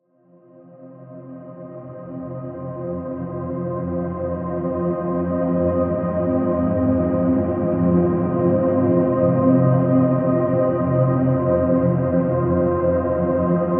Pad F; One-Shots; Pads; Ghosthack; Color Bass Essentials

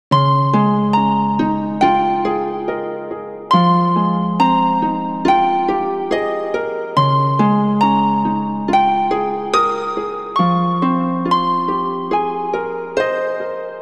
Nostalgic Melody Loop - 140 BPM F Min; Cymatics; Oracle Sample Pack

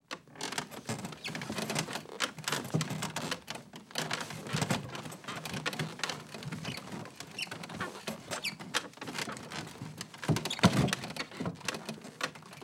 Wood Creaky Movement; Fairlight Sound Lib

